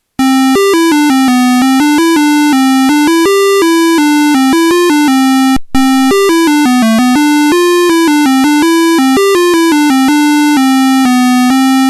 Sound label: MZ-80B; Walk Wan